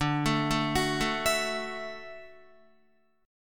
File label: D-Major Add 9th D down Guitar; arpeggiated; JGuitar